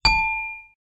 bell puzzle; Frogsong

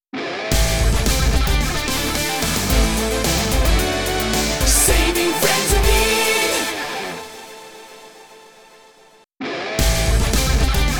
Stingers success; Teenage Mutant Ninja Turtles: Splintered Fate

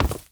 Moss Knight Land

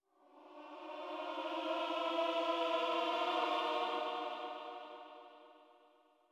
Choir

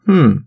Chorus voice

